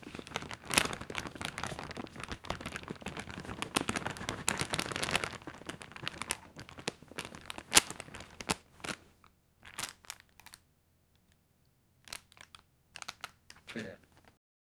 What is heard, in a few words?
man packing camera in sma, bag